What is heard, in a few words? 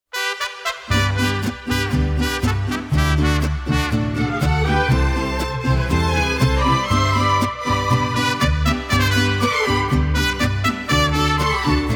mariachi